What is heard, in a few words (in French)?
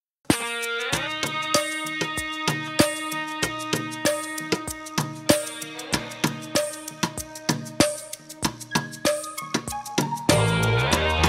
accords